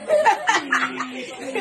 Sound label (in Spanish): risa